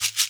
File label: Shakers